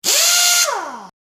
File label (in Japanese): 電動ドライバー